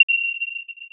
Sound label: ui hover, sfx; prism